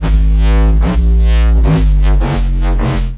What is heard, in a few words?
Sound FX Lazer Reese